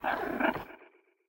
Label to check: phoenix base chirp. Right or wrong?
Right